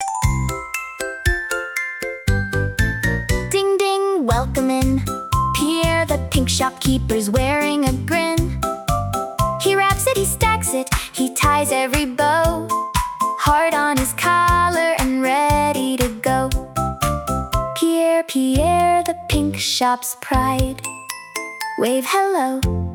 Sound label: pink shop jingle